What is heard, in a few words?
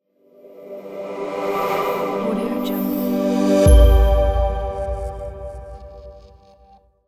smooth logo sting reveal